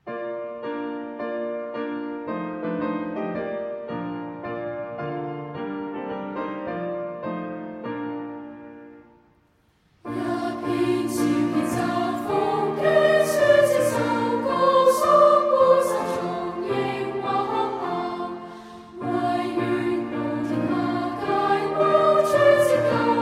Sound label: School Song